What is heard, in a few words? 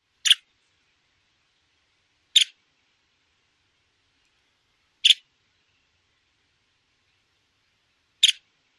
calls